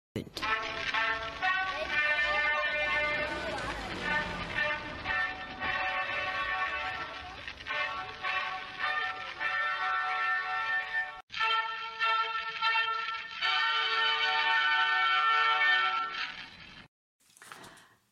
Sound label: Fanfare